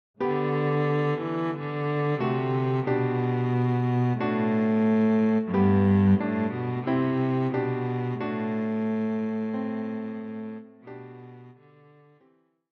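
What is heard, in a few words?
cello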